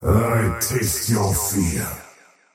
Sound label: cast pain; dota; faceless void